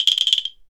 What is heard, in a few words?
CLKR TOY; ILIO; Synclavier Percussion 2; World & Orchestral